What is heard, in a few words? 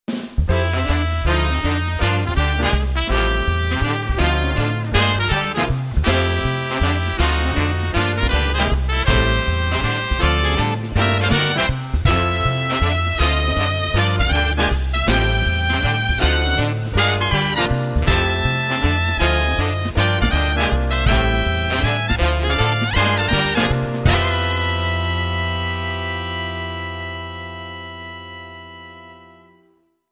Jazz Brass